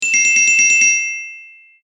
正解

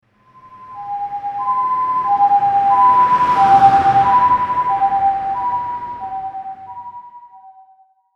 救急車 通過

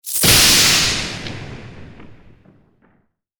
雷

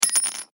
薬莢

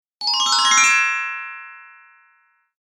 グロッケン グリッサンド

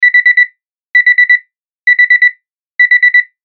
アラーム

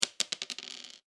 小さい ダブル ダイス

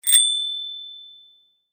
自転車 ベル

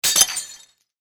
お皿が割れる音

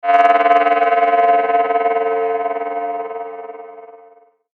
不気味な出現音

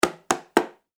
ドアノック

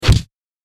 打撃

